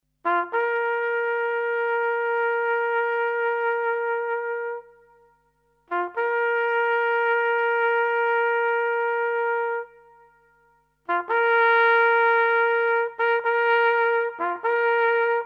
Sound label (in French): sonnerie aux morts